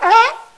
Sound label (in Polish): SLIMAK